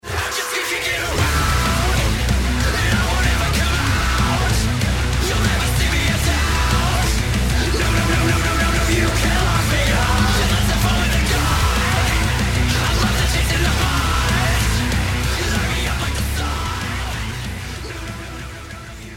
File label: start action, music